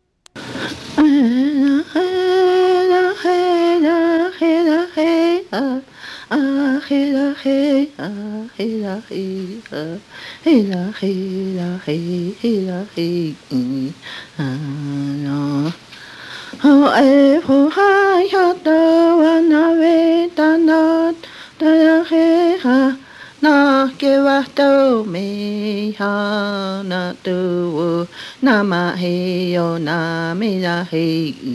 Ho'eevehohaehotoanavetanoto, hymnbook

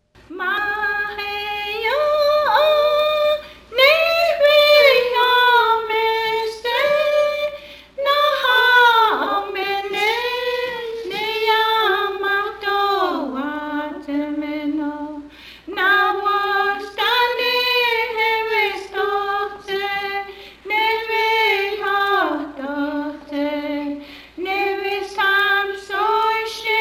hymnbook songs, cheyenne language; Ma'heo'o Nehve'hoomestse

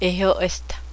whoop

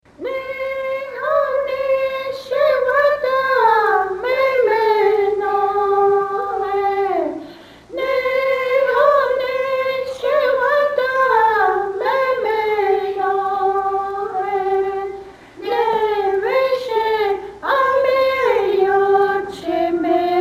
hymnbook songs, cheyenne language; Neho'e Nehneshevatamemeno

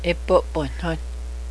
drumming, powwow

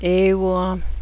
groan

